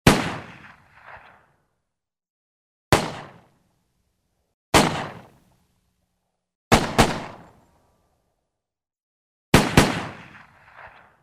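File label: pistol sounds; zvuki pistoleta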